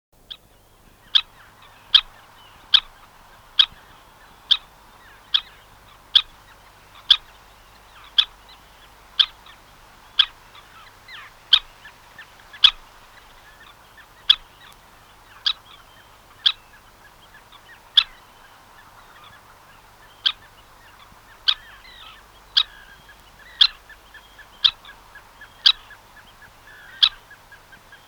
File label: Red-necked Phalarope